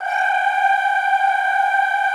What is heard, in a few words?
G4, Classical Choir; Best Service; Extended Classical Choir